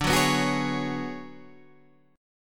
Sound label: B Minor 6th Add 9th D